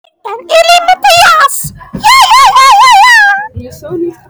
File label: jajaja